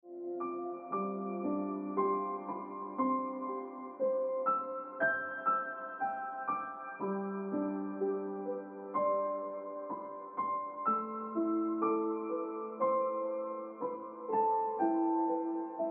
Ringtone Piano Music

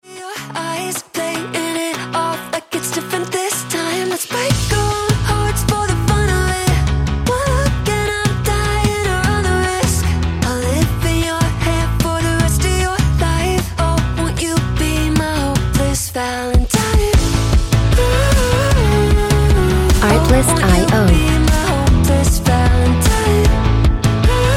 Ringtone

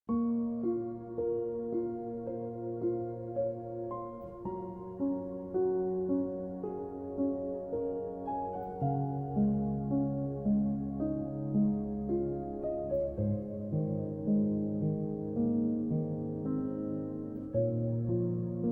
Celestial Ringtone

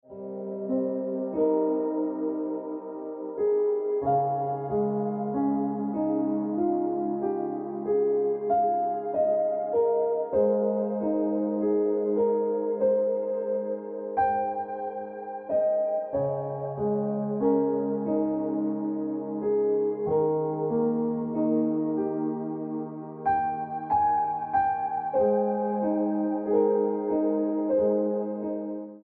Keep It Colorful Ringtone Piano Music

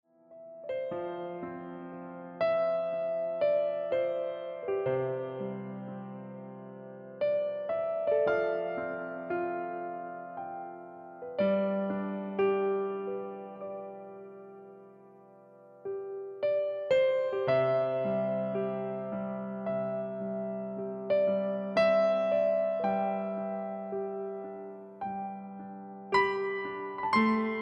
Ringtone Piano Music

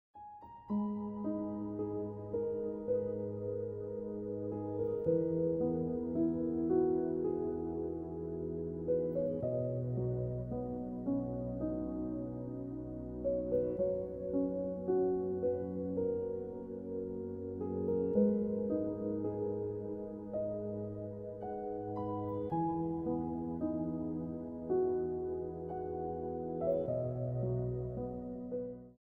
Piano Music

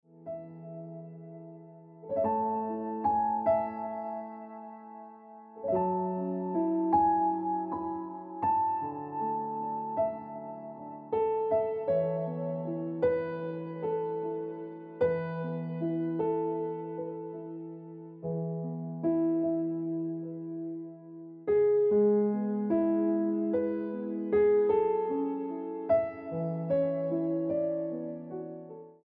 Happiness Of Waiting Ringtone Piano Music

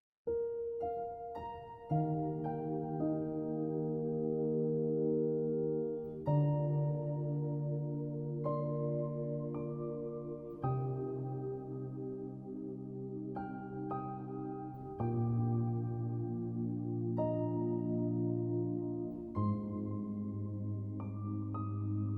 Piano Music

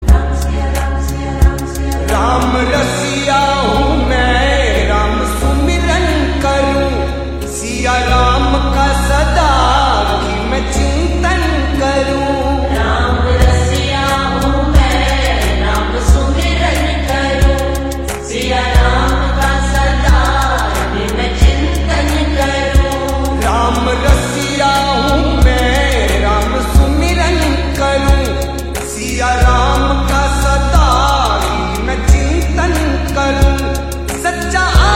Lofi Ringtone

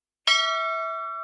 Temple Bell Sound Effect Ringtone

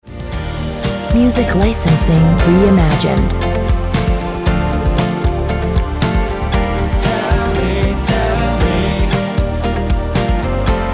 Ringtone

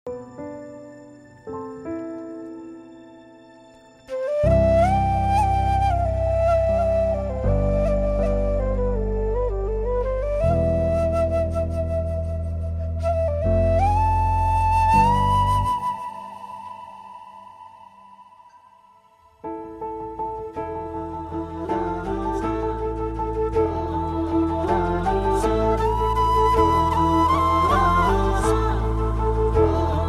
Heart Touching Ringtone; Premalu; Rejection Scene

